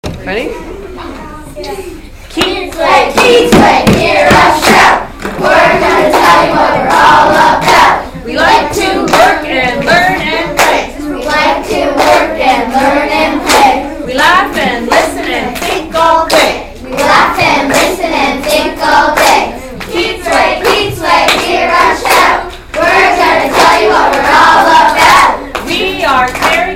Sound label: School chant